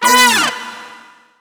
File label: trumpet